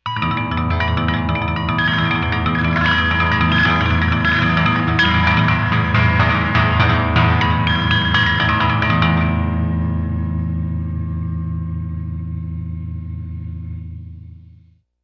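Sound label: Egtr chopsticks, electric guitar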